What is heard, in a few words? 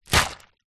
foley small plastic bag filled with water place down on wood surface; zapsplat